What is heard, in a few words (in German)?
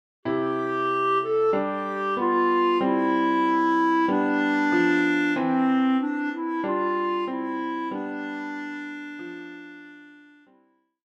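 Alle Jahre wieder Bassklarinette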